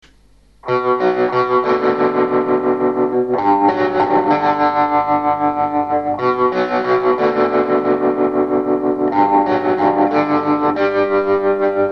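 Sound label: tremolo; משהו מסובב במהירות גבוהה מאד את ידית עוצמת הקול במגבר הלוך וחזור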